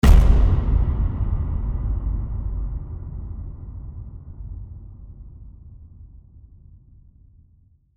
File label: Epic Low Hit